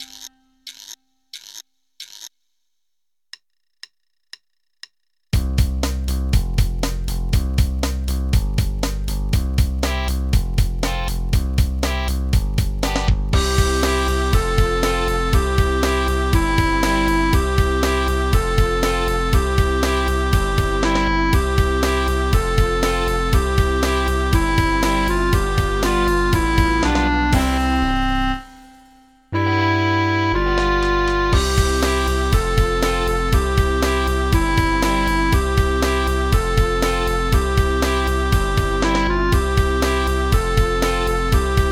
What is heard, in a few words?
My Robot medium accomp